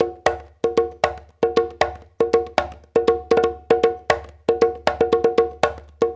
TAP, African; Spectrasonics; Supreme Beats; African Contemporary

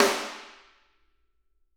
SD, Drums; Bob Clearmountain Drums I

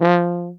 BARITON; Best Service; Alpine Volksmusik

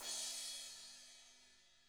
BRUSH CYM, Drums; ILIO; Double Platinum Drums 1

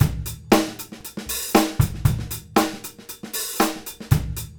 groove, Grooves 99-132bpm; E-MU Producer Series Vol. 8 – Platinum Phatt

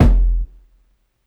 KICK, DRUM N BASS; JUNGLE BEATS; DRUM N BASS KIT 1

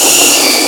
Crowd Chant; SNES; Super Copa

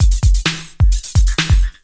drum 130 electro, drumloops

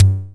Simmons Bd, Percussion Hits

